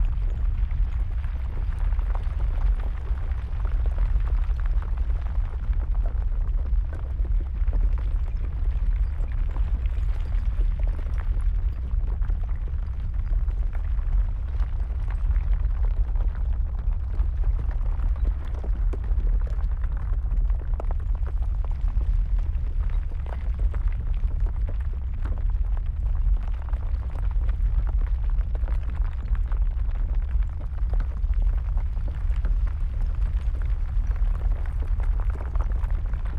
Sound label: Earth Sphere Idle Loop; TANK; 3Souls